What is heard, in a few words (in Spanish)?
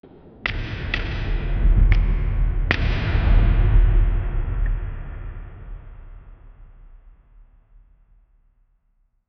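sonido de terremoto dentro, cave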